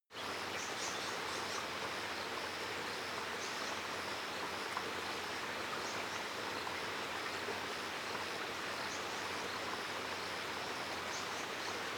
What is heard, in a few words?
africa winter bird water soft